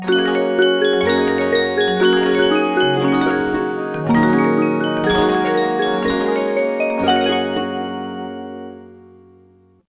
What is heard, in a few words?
Chinese Melody, ringtones; InFocus M320S